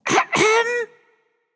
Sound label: clear throat, voicepack; smite; jorm